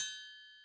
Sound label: percussion, Millenium MPS-400